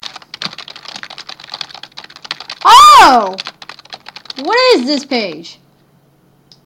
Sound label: Type